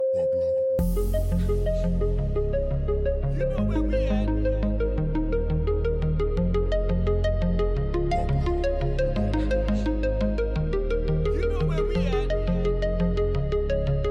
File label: POP EDM